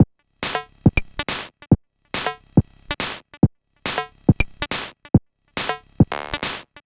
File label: Drums, 140 BPM; CHIPSHOP DELUXE; CHIPSHOP LOOPS